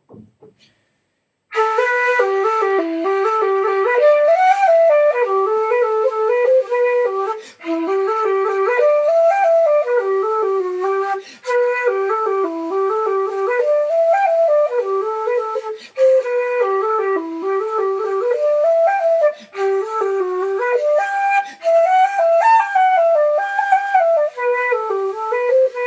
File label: jig